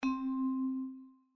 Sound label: notification low